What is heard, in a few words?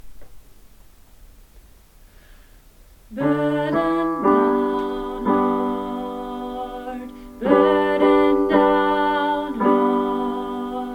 burden tenor